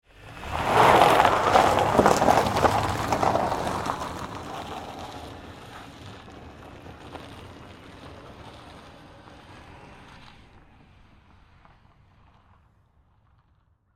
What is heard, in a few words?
Saturn Vue Away, Automobile, Pull, Away, Snow, Ice, Crack; Transportation; BlastWave